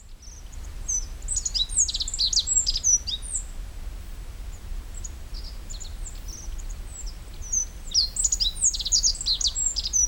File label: Emberiza godlewskii song